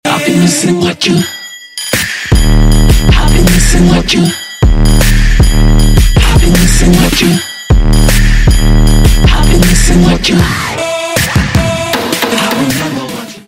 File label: 90s Music